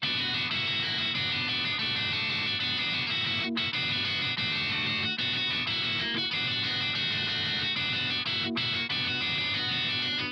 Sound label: EG Chord Real Strat